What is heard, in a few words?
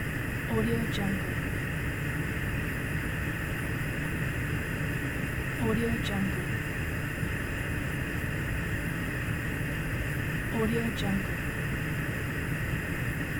gas stove burning